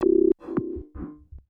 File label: AMBNT 160; Ambient